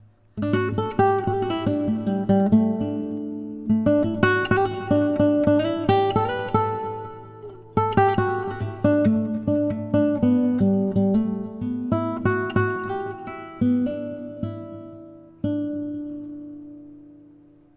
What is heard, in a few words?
reverb sample